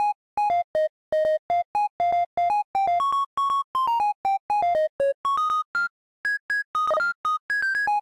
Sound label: Melody